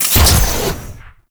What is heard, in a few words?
Mech Jump Boost Start; Nechro